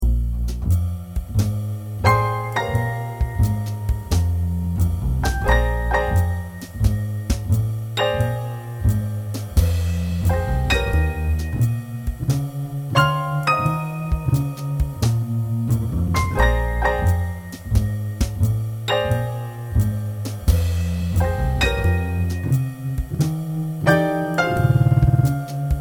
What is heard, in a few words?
august blues